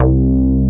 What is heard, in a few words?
MOOG BAS